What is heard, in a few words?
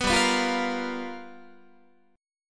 G 7th Sharp 9th G chord, Guitar; G7#9 strummed; 14,15,14,15,14,16